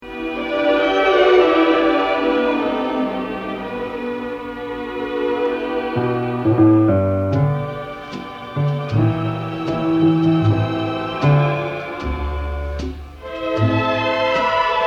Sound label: Artistry In Strings